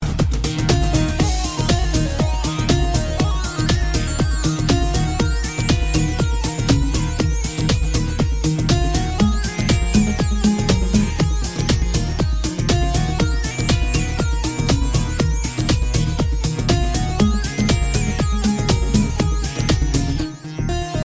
Indian Remix

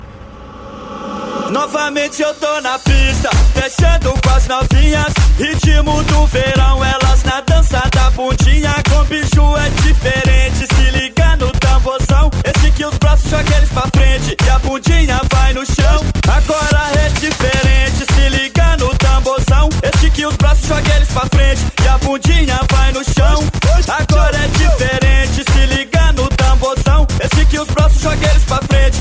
Electro Funk

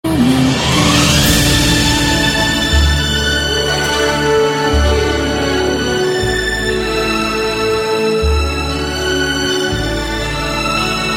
Romantic

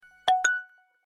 Message

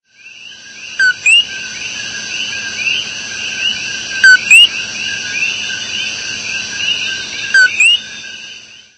Birds Sms

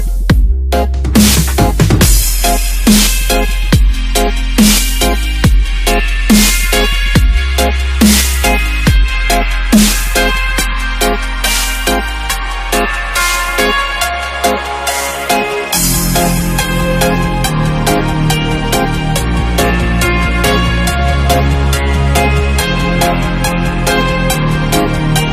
BLACKBERRY

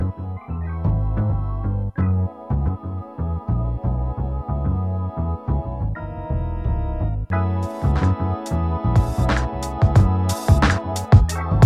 Jazzclubish

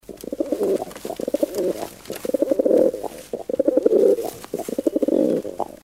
Pigeon

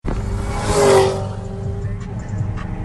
GT500 fly-by

